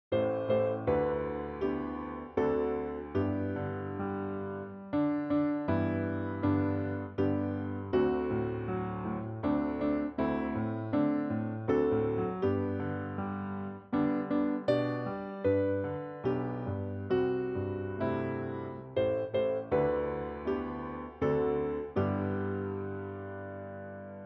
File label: EPiano